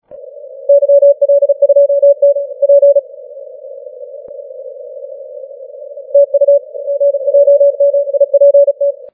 30m cw

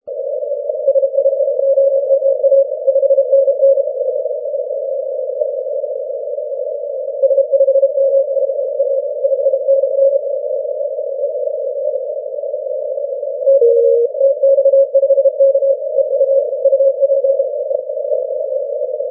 17m cw

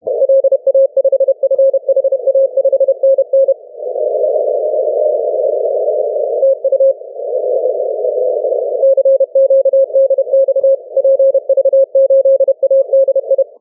6m

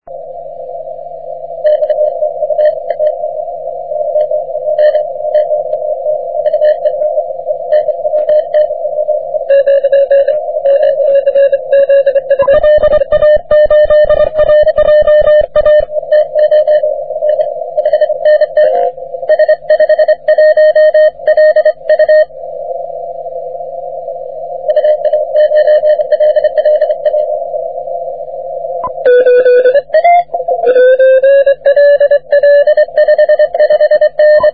30m cw

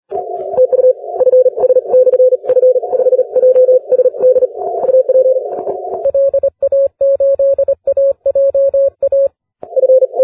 40m cw

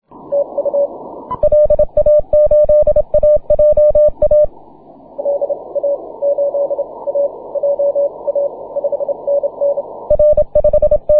cw

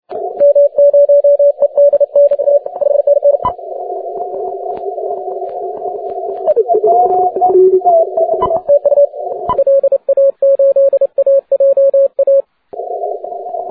cw

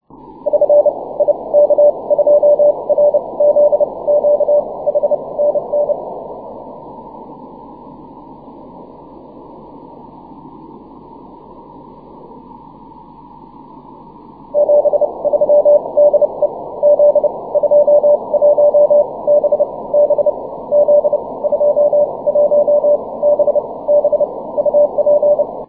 cw

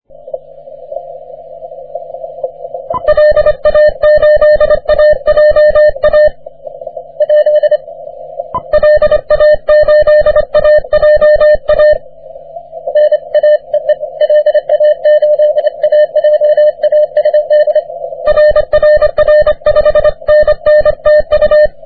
40m cw

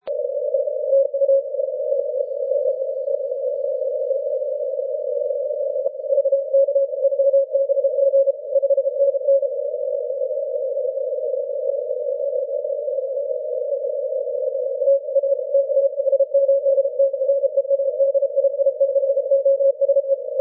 20m cw

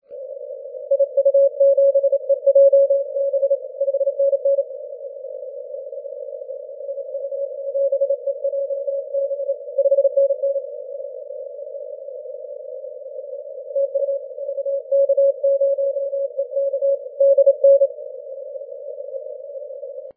30m cw

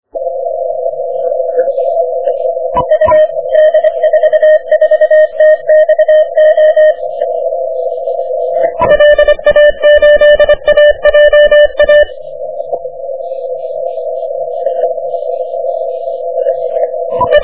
30m cw

